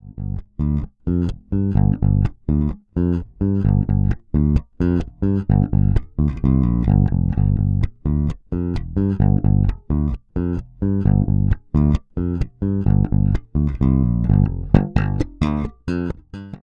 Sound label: Fodera NYC Empire Jazzbass Both Pickups